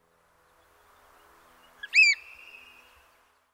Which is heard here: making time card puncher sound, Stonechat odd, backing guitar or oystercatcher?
oystercatcher